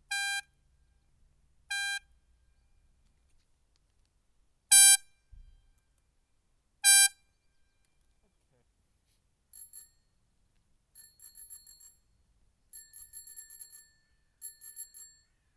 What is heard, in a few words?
airzound